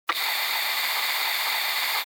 Gas mask oxygen refill or release; Military Equipment

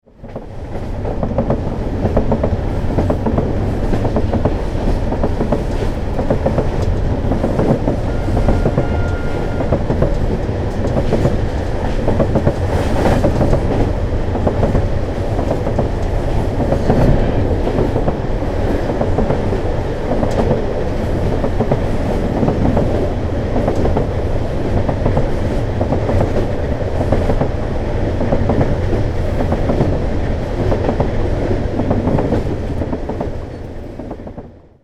Passenger train inside perspective cruising speed

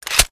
9mm handgun mag insert; War

